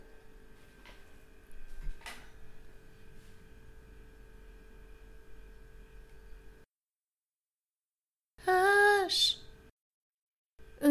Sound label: soprano